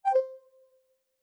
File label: Toggle Off